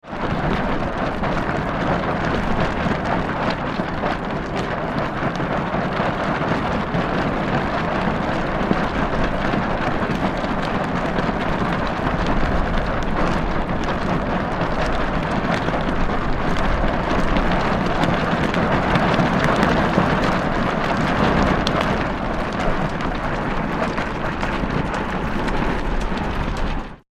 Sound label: Wind Strong in Plastic Sail, Fast flapping; Weather